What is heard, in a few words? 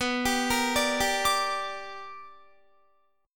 B Minor Major 7th Sharp 5th Guitar; BmM7#5 arpeggiated